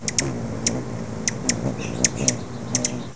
rufous

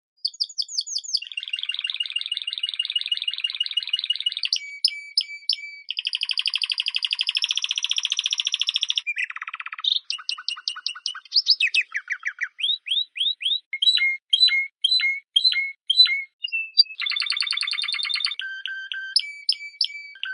adzan

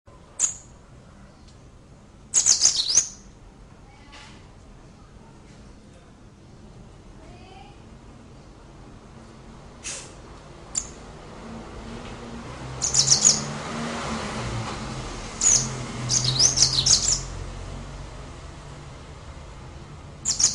brimstone canary

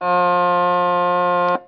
nautical

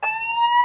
emergency

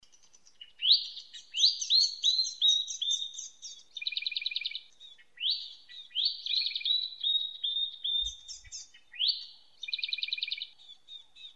red faced cisticola

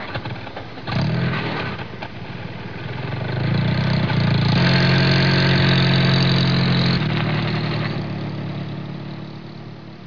trucks

